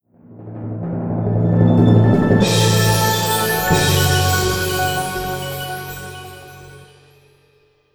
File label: Epic Orchestral